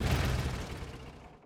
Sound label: vehicle excavator turnoff